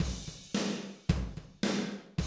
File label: 110, Classic Drum Loops